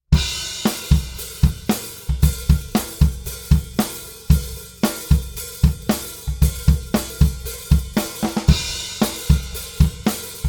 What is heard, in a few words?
High Hat Ride (with damped snare)